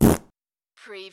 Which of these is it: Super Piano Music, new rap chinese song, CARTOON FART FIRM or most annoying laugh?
CARTOON FART FIRM